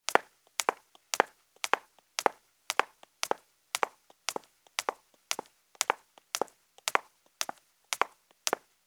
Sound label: Footsteps Heels Marble Down